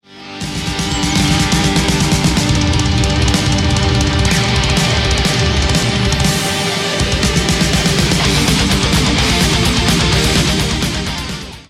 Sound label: Dirty slide